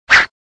Whoosh; Cars; tractor tip

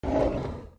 drawer slide; camp rock; rock n row

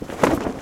parachute deploy; toystory; sarge

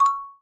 button sound; princess

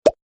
item drop; perry; perry sightings

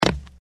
jump run; disneyxd; stuntastic

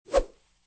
Whoosh; Cars

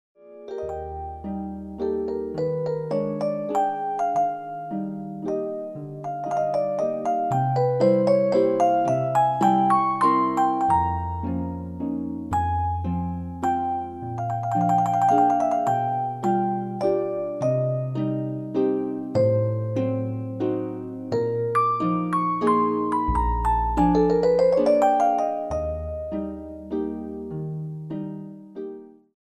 nocturne in e flat no 2; baby einstein; disney

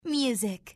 game music; disneyjunior

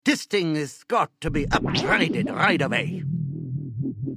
going down

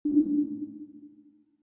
sub nav

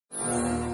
match 4; john carter; lost symbol

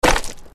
land skate; disneyxd; stuntastic

